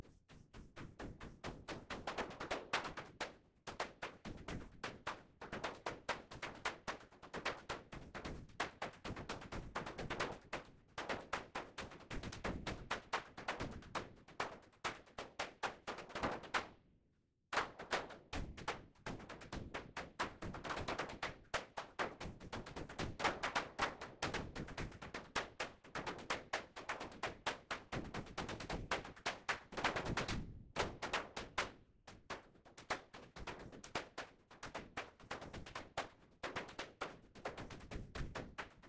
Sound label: CONGAS Residual Noise